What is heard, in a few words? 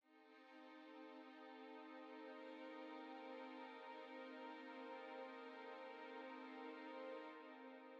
Strings, cinematic drama Soundbeds; musicradar